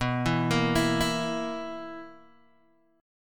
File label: B Major 7th Suspended 4th B down Guitar Open D